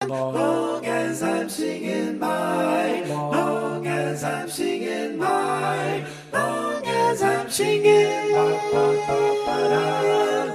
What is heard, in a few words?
Tenor, barbershop